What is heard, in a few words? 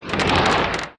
Scrape Bookcase Slide; Doors